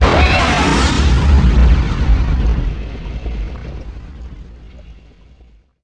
explode tie sentinal

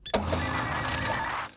probe scan

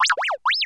astromech confirmation

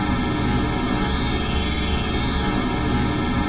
attack; mancatcher

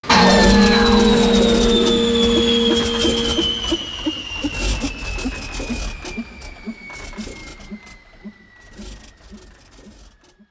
millenium falcon hyperdrive fail stereo

